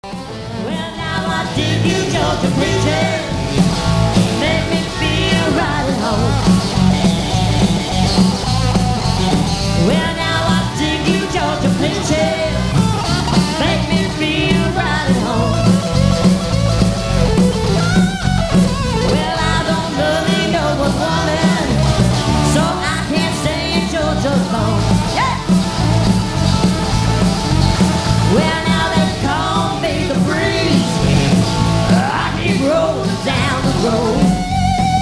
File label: Classic Rock Band